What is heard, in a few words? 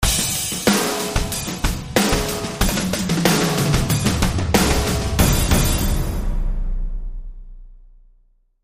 Rockin Thunder Sting